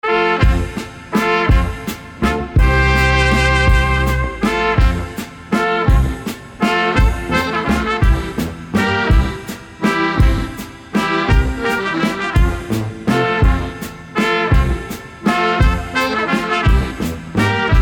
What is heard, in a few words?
Cuckoo Waltz